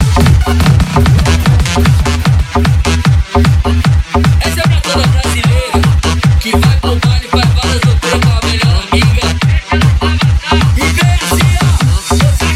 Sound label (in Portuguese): pancadao automotivo